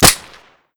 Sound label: SD Fire Local; Weapon Package FortWars